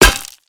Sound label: lead ball